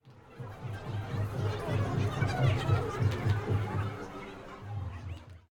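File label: ambience pool; pork knox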